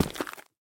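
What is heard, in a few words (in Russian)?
короткий чёткий хлюпающий звук ступания по грязи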